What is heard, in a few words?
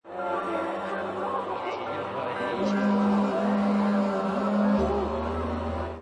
oohoohoohagh